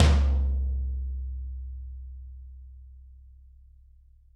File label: Toms, Drums; Bob Clearmountain Drums I